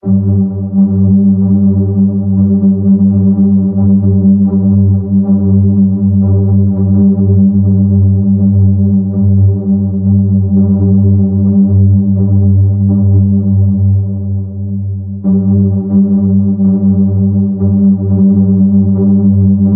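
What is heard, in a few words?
short piano hum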